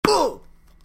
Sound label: khaa werewolf